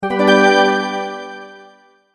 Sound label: multimedia tone advance; Tones (Sound Effects:Sound Design); McKinney Sound